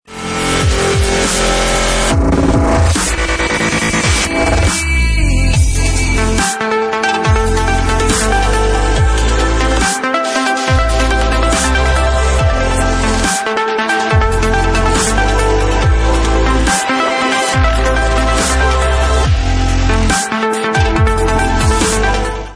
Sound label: dubstep